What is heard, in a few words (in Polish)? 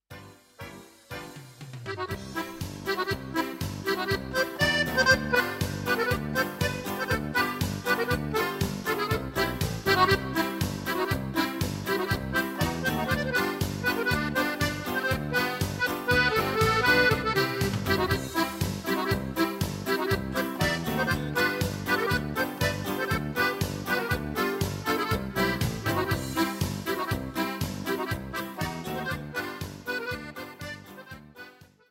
akordeon